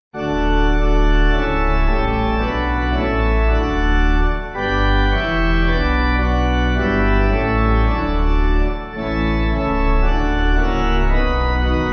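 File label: Pipe, Pipe Organ backing; Christ The Lord; Wurtemburg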